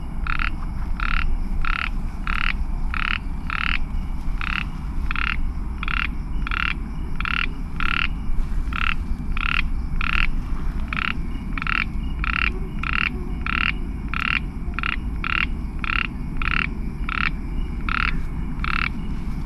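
microhyla fissipes, East Asian Ornate Chorus Frog call; Singapore.; Recorded after heavy r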